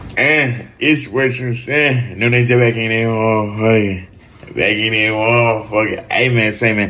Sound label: ahh